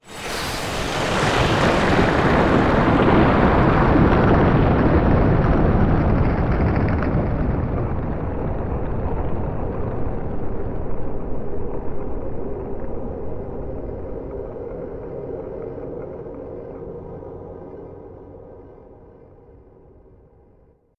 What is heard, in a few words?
Magic Ambience Ice; David Dumais Audio; Ice & Frost Magic 1